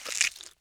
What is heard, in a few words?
bite; munch